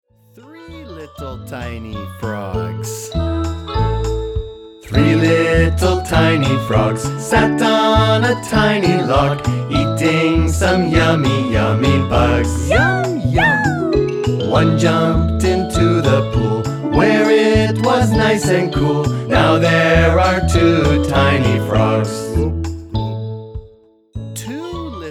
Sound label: Five Little Tiny Frogs